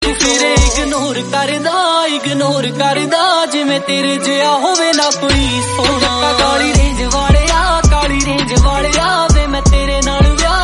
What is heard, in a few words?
Punjabi Song